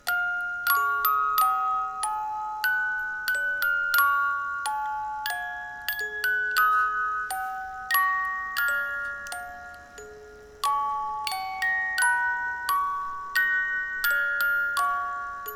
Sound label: Music Box Loop